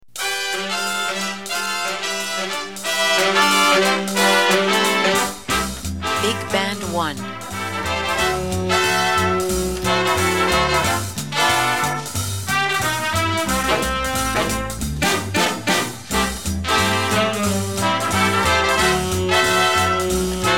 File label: bigband